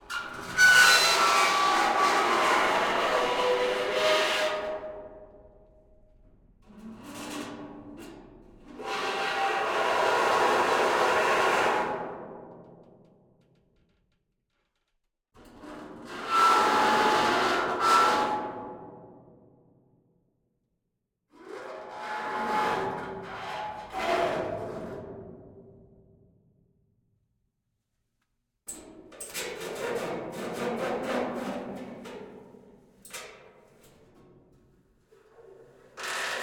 Large Metal Air Drum C, Dark Resonance, Creaking, Metal; 192 kHz; Double Trouble Audio; Metal Resonances